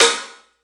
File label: Perc Jingle, Misc Percussion; Drums